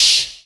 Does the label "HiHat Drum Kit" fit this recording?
Yes